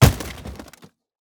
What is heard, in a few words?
plastic break